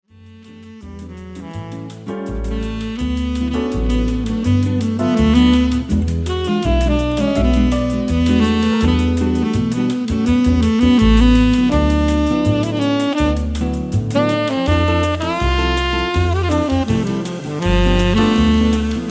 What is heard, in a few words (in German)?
Tenor Saxophon